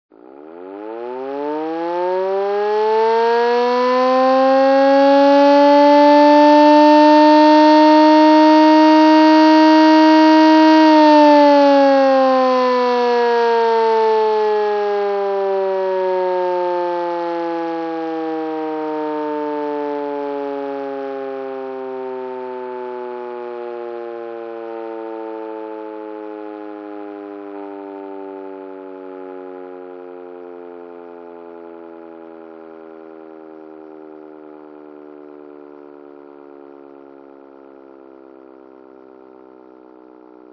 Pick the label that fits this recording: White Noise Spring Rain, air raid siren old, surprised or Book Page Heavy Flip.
air raid siren old